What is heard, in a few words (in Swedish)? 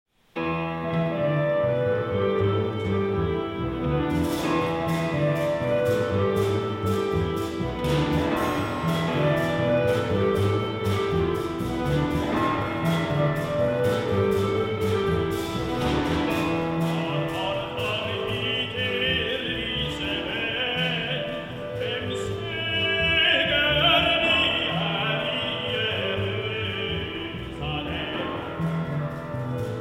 bas riff